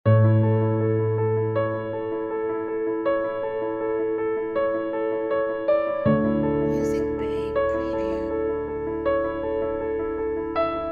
Inspiring Emotional Strings And Piano

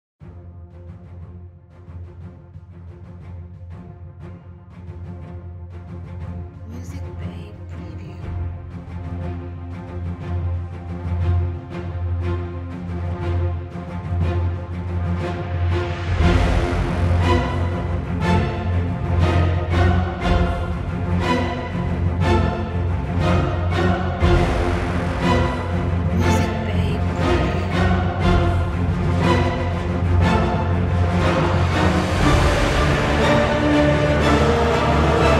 Fade in Action Epic Trailer

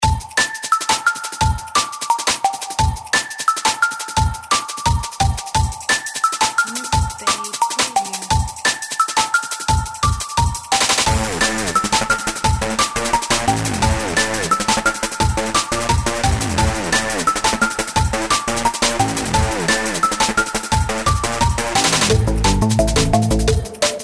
Dynamic Breakbeat

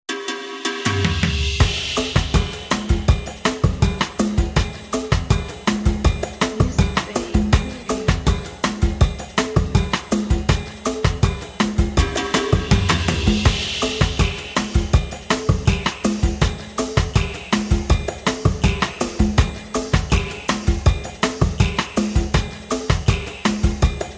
Latin Pop Percussion Groove

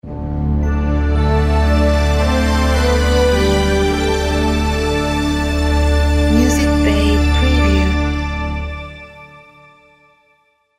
Cinematic Logo

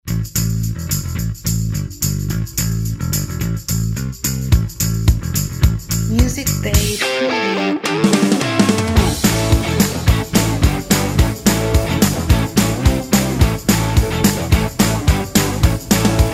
Modern Rock And Roll

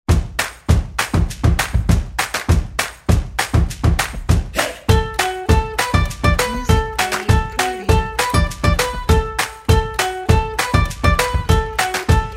Upbeat Positive Pop Rock

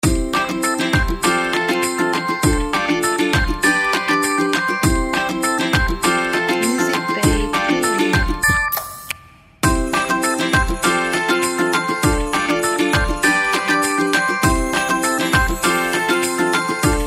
Funky Show Intro

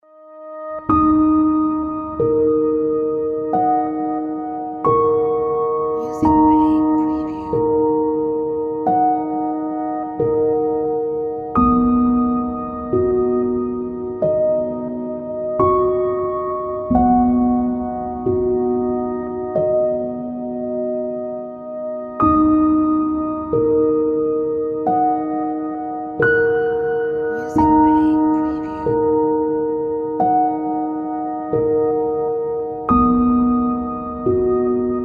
Cinematic Ambient Piano

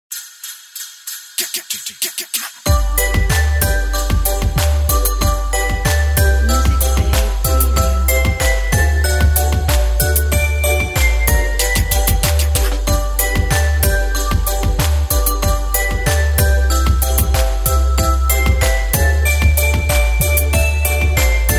Jingle Bells

